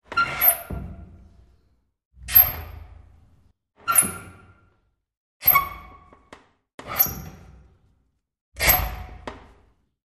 Door Plastic Pvc Touch

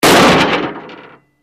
Large Crash Metallic

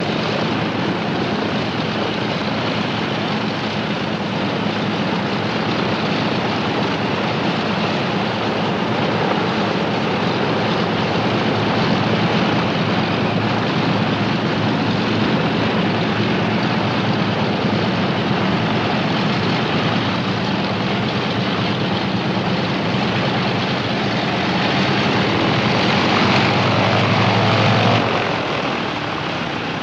B29 Taxi Rev Shut Down, superfortress

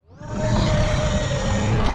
Creature Growl

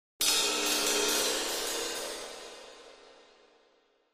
Cymbal Shrt Cacophon

